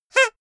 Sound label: hoot loud short